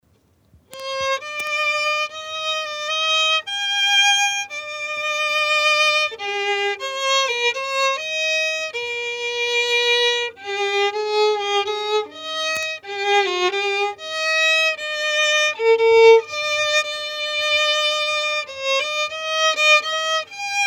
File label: violin